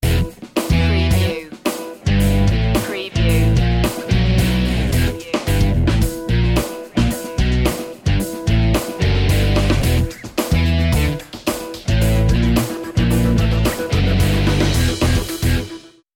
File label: guitar fusion sting